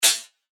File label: FX Hat, cymbals; revealed cymbals vol 1